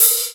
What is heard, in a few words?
Hats Real Open